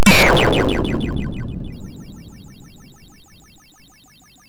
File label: MOS6581; Demogame Example